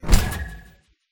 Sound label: Land; Garry's Mod; boxy boo v2; boxshe boo